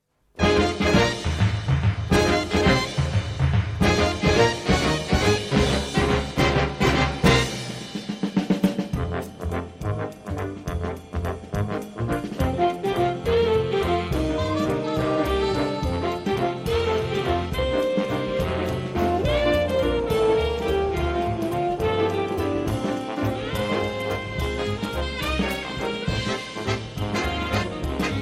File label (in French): generique; mannix